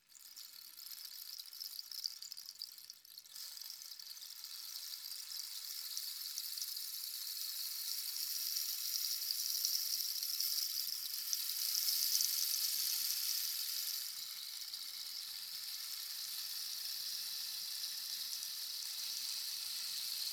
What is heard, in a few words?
Rainstick, Slow, Overtones